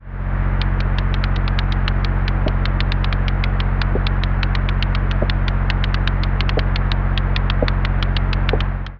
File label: wet belt failing sound